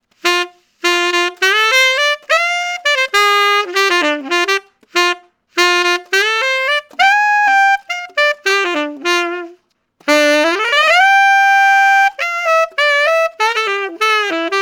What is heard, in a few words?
Blues Scale; SYOS